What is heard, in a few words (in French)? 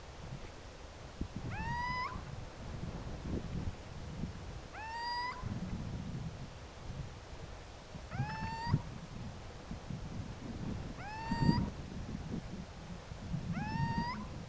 chevêche d'athéna chant